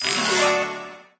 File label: 3 Star; candy crush saga gamerip